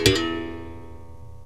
BERIMBAU; OMI - Universe of Sounds

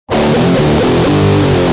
G Jam, Guitar; Industrial

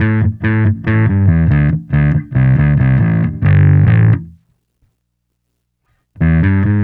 Bass

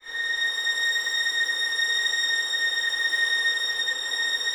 Violins, A#2, Classic Orchestra

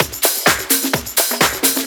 Discohouse Loop 128 BPM

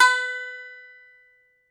BAGLAMA, Plucked Strings

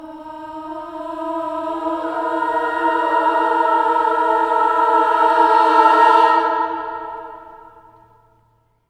CLUSTER, Voices

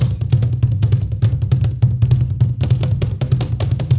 ZULUS, ZULU DRUM

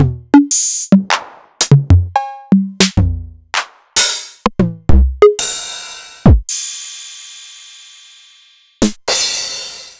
Novation Drumstation

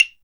WHACK CLAVE; CLAVES

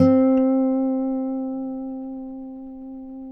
Finger C 4 R, Guitars; Spectrasonics; Hans Zimmer Guitars VOL-1

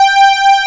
PA, ANA PAD

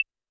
percussion, Roland TD-20

